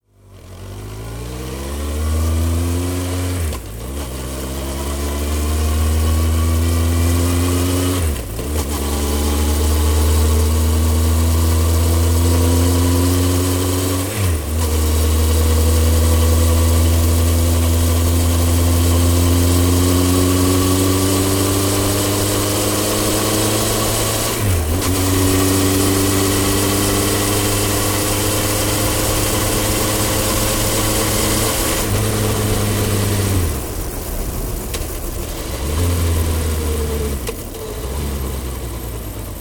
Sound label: Motorbike Honda 1100 On Board Travel; Various speeds then slow to stop; Motorcycle; Tom Hutchings